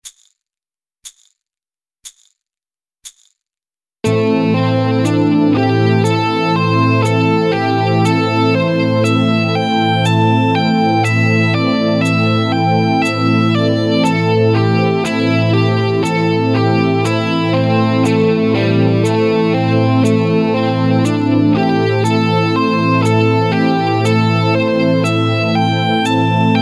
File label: LEGATO